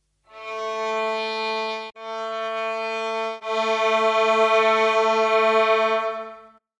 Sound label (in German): geige